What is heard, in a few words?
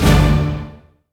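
Orchestra Hit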